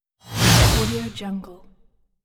whoosh

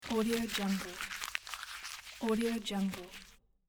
paper foley slow squashing

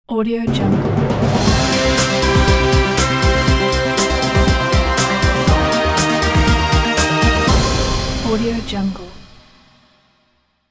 sport tv logo

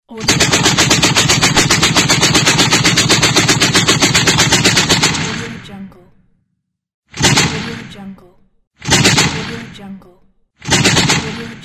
machine gun shot; volkovsound

